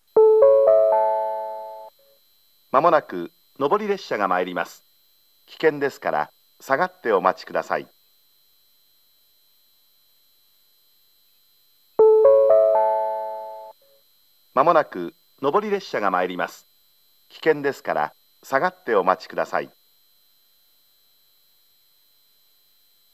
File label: aburakawa, station; jr east